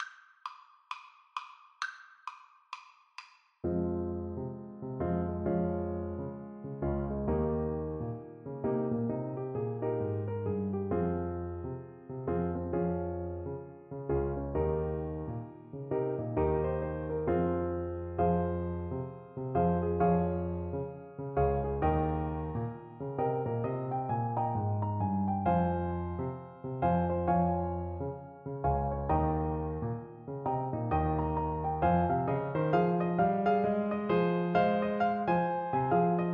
tam lin, Trumpet

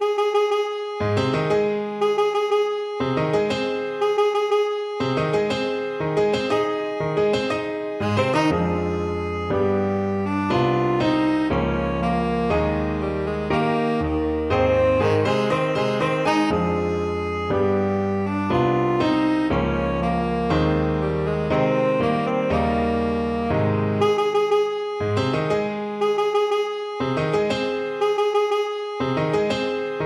tenor saxophone

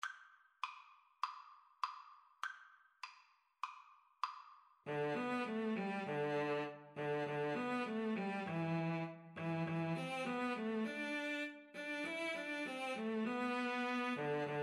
cello duet

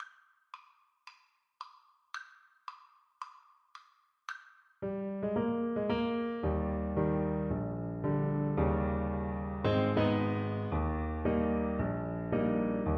Saxophone

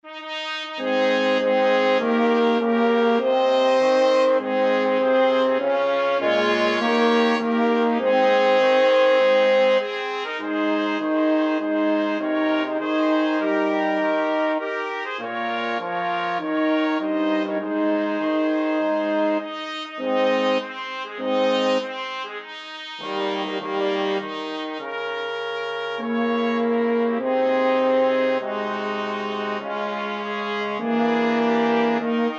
brass quartet

